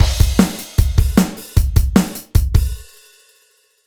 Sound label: Rock Drumloop; Sonic Foundry (Sony Creative Software); Dr. Fink's Funk Factory